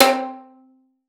C4, VIOLIN PIZZ; Xsample VOL-5; 90 s Sample CDs

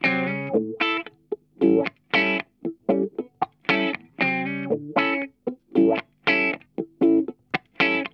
CROSSING, Funk Guitar; Zero G - Funk Guitar

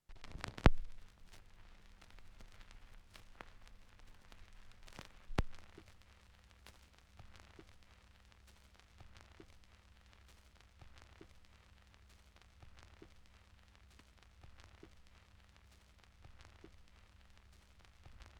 Turntablism; Sonokinetic - The Roots Drumloops

